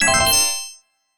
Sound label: get item